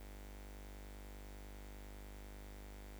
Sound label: Ham Noise